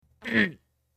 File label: grunt